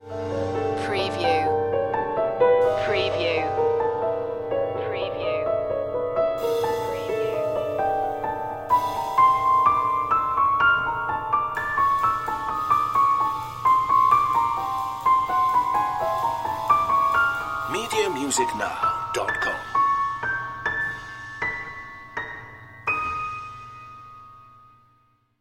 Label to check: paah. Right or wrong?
Wrong